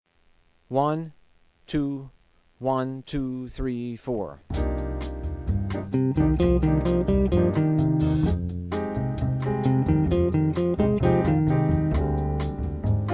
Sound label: Asc; Penta Patterns